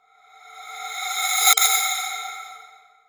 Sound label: Electronic Crescendo